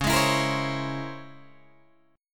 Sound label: Db Minor Major 13th Db Guitar DADGAD; DbmM13 strummed; JGuitar